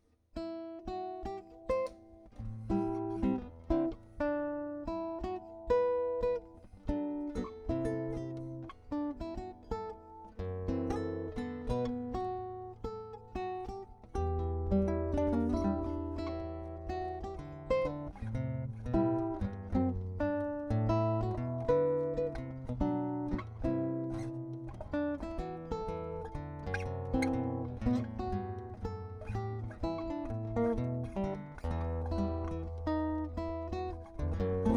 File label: for two guitars